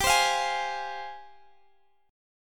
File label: F Minor G, chord; strummed